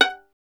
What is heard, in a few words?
STR VLN, Solo Instruments; Miroslav Vitous